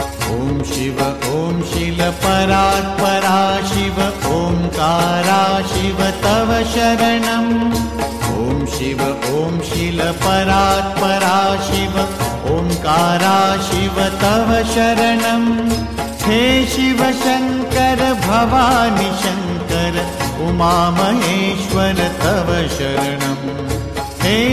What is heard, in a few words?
Om Shiv Om Shiv